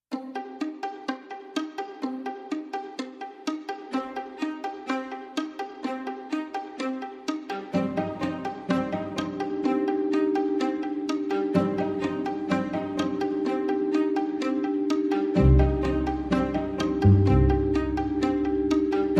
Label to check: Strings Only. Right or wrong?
Right